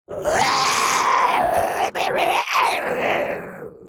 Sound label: Demon beast imp monster growl grunt